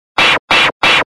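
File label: scratch sound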